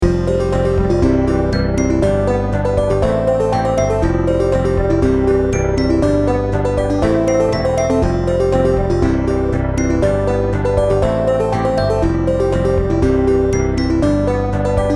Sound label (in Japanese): OP・ED・街の風景などマルチなBGM｜ファンタジー